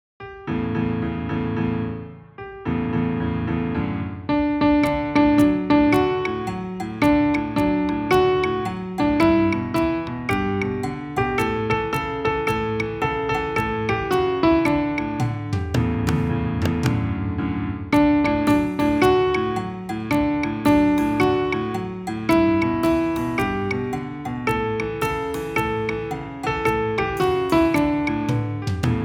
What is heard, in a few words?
Karaoke